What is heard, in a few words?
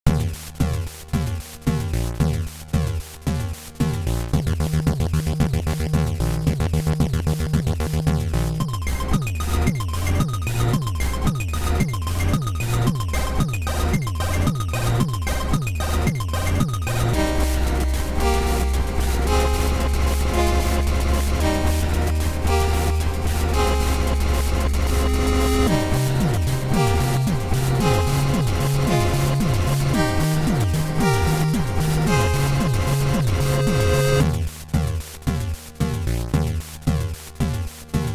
monster rave